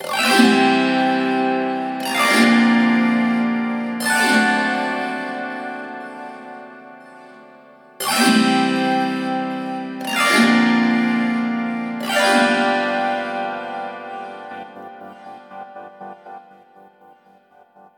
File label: strummed